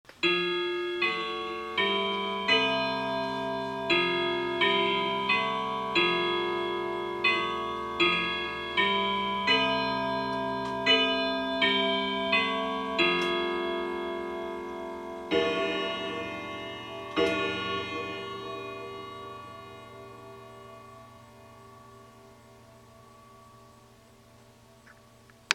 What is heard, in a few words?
Grandfather Triple Chime Westminster